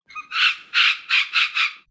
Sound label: monkey; minesweeper